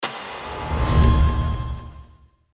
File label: Cyber, sound effect transition